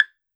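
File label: hi block; E-MU Producer Series Vol. 7 – Old World Instruments; Drums&Percussion; Salsa Kit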